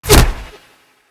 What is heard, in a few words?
semiauto; smite; Anhur